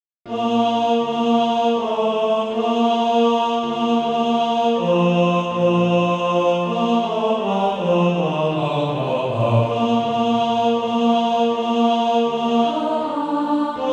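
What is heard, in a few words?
tenor, Practise Music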